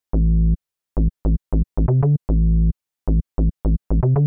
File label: Bass; Rockin